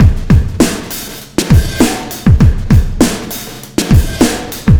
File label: Sky Lab Beat, Processed Drumkits